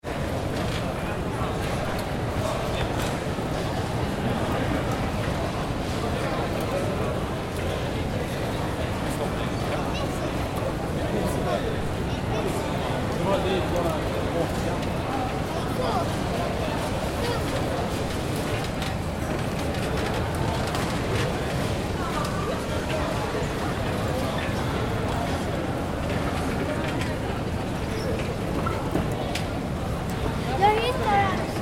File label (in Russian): zvuki bagazhnoi karuseli v aeroportu